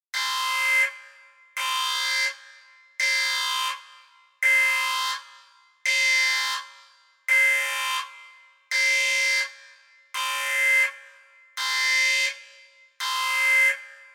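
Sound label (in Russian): корабельный сигнал тревоги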